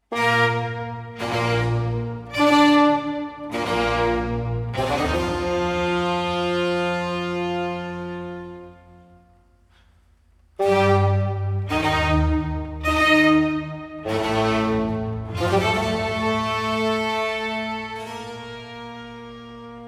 Concerto for fagotto, strings & b.c. in B flat major, Largo; Antonio Vivaldi (1678 - 1741); Vivaldi - Concerti per fagotto e oboe (2004); RV501 'La Notte'